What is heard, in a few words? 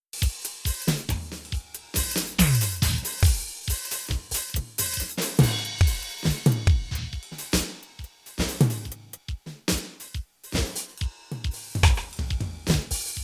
Drum Jam